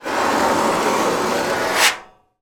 foley metal rub scrape hollow

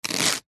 celery raw cut knife single

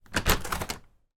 household caravan front door open

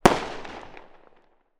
firework explosion; sound effects one

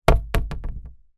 foley wood hardboard thin lean gently on wall

